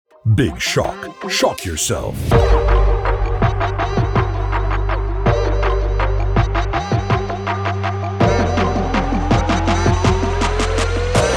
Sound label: ringtone